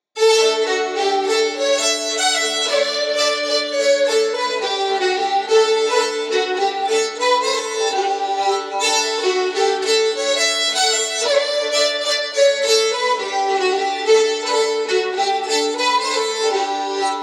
Polska; efter Karl Johan Karlsson Brattfors; Dalorten sprang

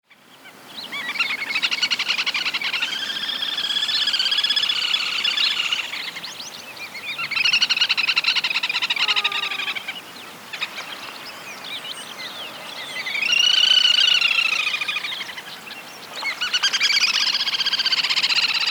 Smådopping

